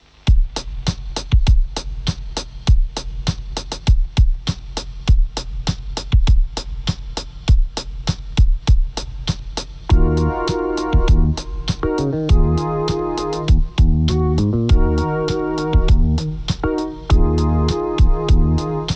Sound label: funk